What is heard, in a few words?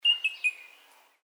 bird lithgow f call stereo